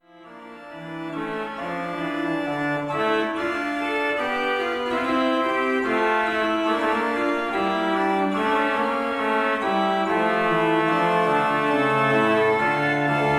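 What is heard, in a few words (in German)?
Prengel 14 II P, pfeifenorgeln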